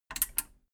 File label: metal button radio click power on slow